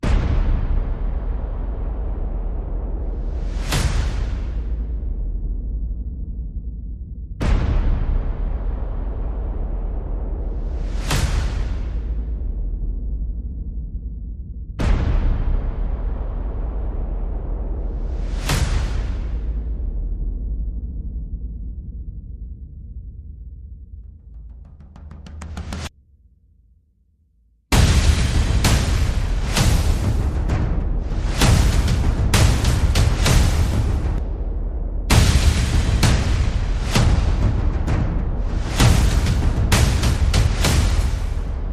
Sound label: Drums, Perc Only